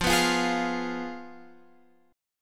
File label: F Diminished Guitar Open F; x,12,11,12,11,12; Fdim strummed; JGuitar